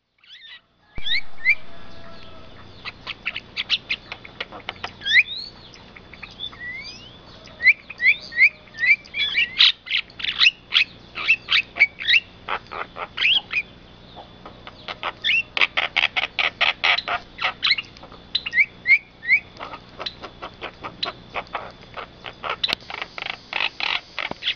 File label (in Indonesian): kerak kerbau